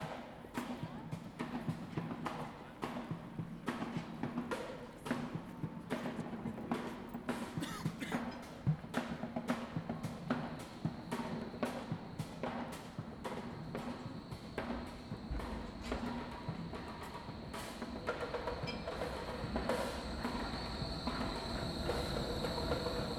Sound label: Alexanderplatz drums Tram